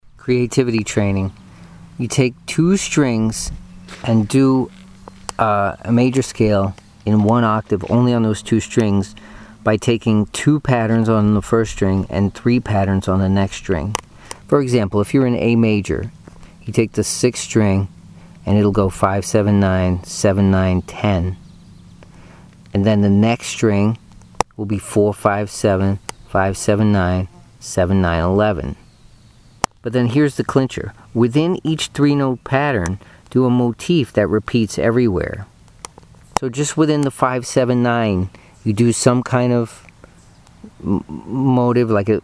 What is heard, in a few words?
2 strings major scale, guitar